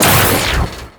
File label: Metronome; Amalgam Moa; Abilities